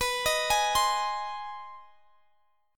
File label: Ab Major B; Ab/B arpeggiated; Ab/B Chord